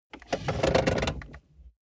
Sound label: car bmw e46 interior seat push back completely rattle